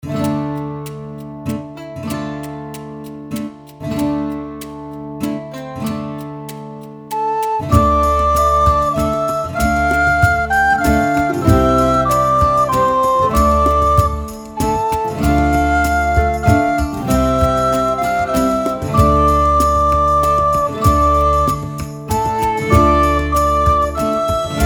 Schottische Weise, Floetentoene; Jede Menge Floetentoene 1

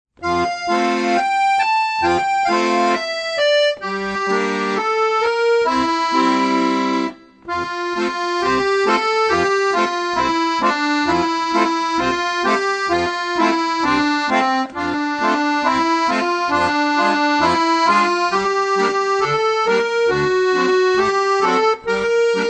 Der verminderte Septim-Akkord, Akkordeon; Akkordeon Workshop Band 1

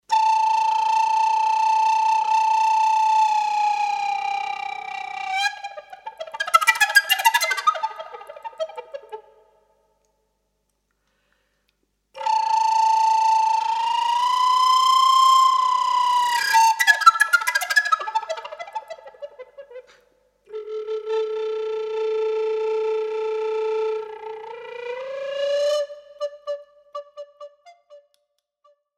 Ringding, Altblockfloete; Schule fuer Altblockfloete 1

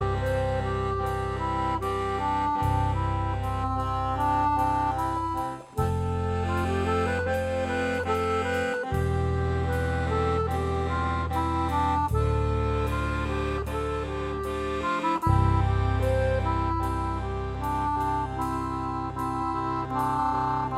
Akkordeon; Akkordeon gefaellt mir 2

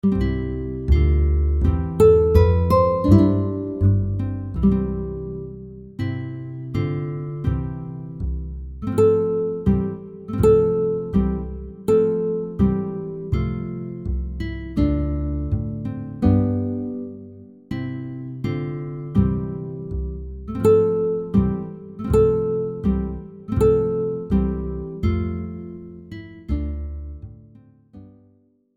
Gavotte allemande, Floetentoene; Jede Menge Floetentoene 1

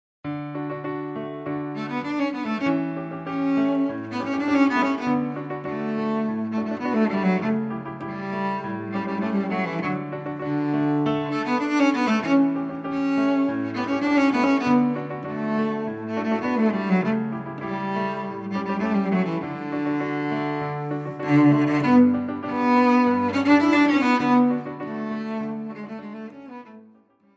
Neapolitanisches Tanzlied; Tschaikowski; Aus der musikalischen Schatzkiste 1 - Violoncello

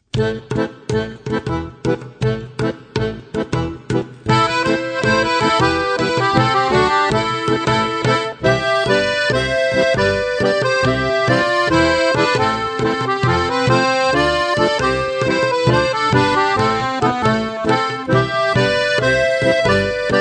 Bulgarien, Accordion; Glavinishka Kopanica; Accordion Trip 2

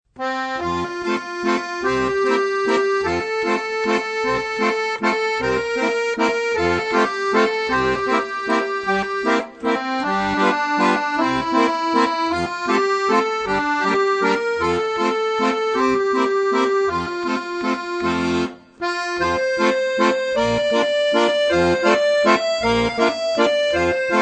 F-Dur-Tonleiter, Akkordeon; Plaisir D'Amour; Die Versetzungszeichen; Die Vorzeichen; Akkordeon Workshop Band 1

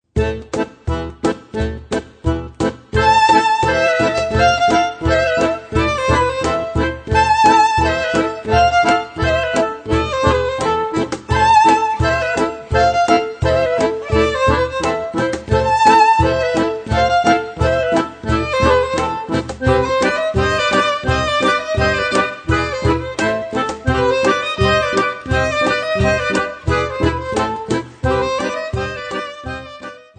Briul pe sase Rumänien, Accordion; Accordion Trip 2

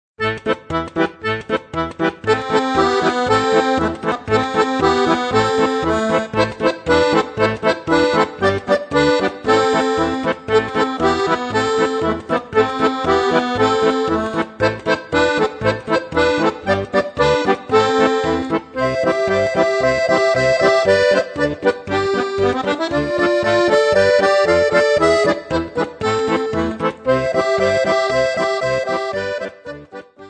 Israel, Accordion; Od avinu chai

